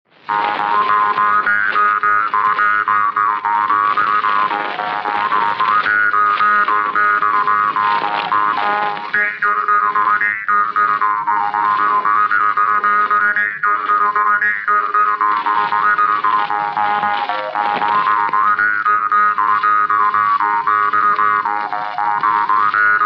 Soome treilender parmupill folk; Haademeeste; Hendrik Grunberg

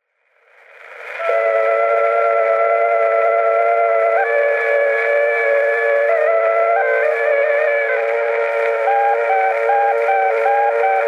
Torupilli Jussi lugu, Emmaste, torupill, folk

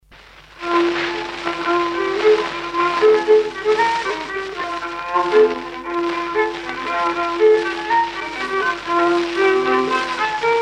Kupparimuori viiul; Kuusalu; folk

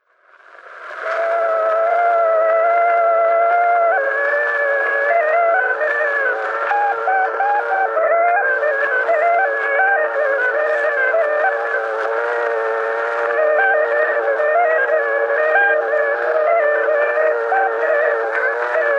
Torupilli Jussi lugu, Emmaste, torupill, folk

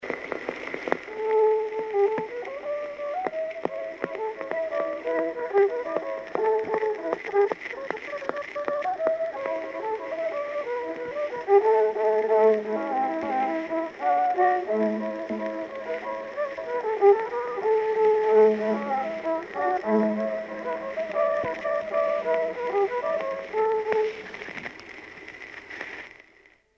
Polka Räpina viiul folk; Peeter Ruusaar

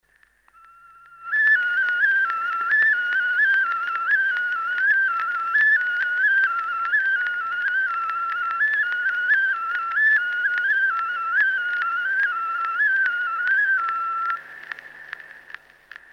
vilepill folk; Kuusalu; Lill tudu; Mart Masurin

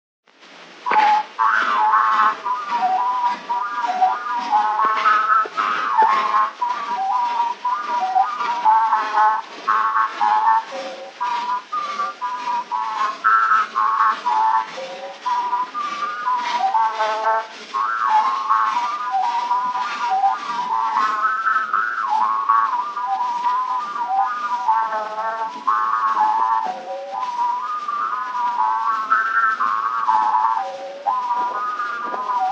polka, parmupill, folk; Mardi Tandi polka; Joelahtme

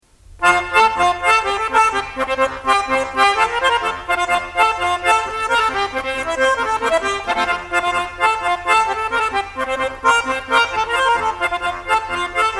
polka, loots folk; Kanepi; Vana Prangli polka

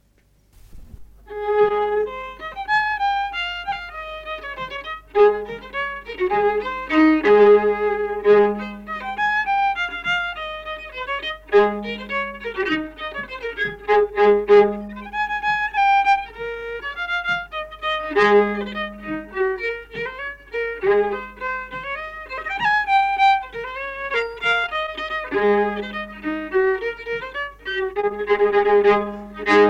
valss, viiul folk; Ruhnu; Peremehe valss

